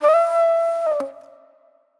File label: Flute Noir; Sonic Foundry (Sony Creative Software); Manhattan Flutes